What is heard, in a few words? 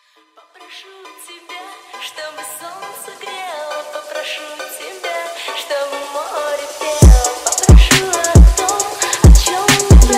phonk remix